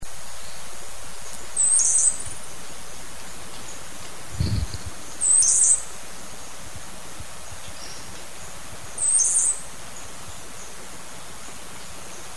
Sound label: uniform finch